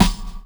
Snare reverb, drum hits; Zero-G; Soundclash

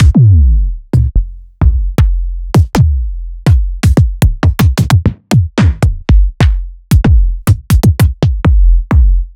Kicks; Electronisounds

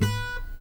ACSSFTFNGR, Guitar

